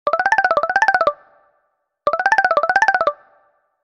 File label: sony ericsson vivaz